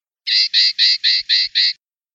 rabieta en ga ga; pardillo

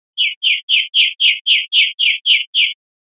golpes corridos yioyio, verderon

